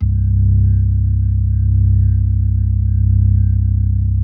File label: C1S, B3